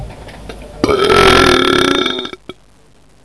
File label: belch